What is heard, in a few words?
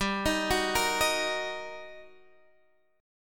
G Minor Major 7th Sharp 5th G, Guitar Drop D; chordshape; down